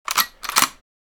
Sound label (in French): Chargeur gun clic clac; BRUITAGES